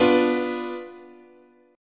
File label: B Diminished, guitar chord